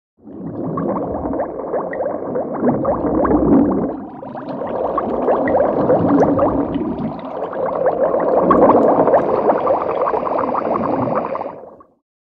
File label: Bubbles Massed Echoe